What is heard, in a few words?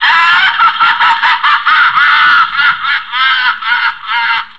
laugh; Philips Multimedia Studio